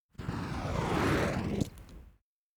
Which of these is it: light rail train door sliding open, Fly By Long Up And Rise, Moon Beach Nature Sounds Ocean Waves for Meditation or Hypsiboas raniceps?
Fly By Long Up And Rise